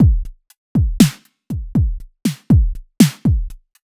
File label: Electrobox, Loops